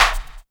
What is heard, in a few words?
clap, hip; Best Service; Dance Mega Drums